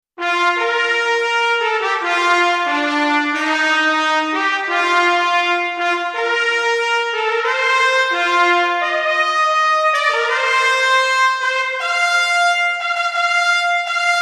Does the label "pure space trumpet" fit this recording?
Yes